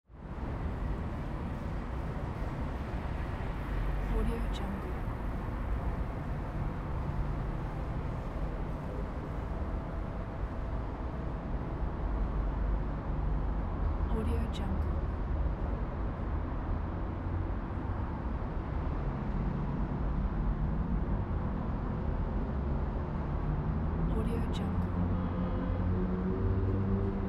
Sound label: pedestrian bicycle tunnel near busy road; urban sounds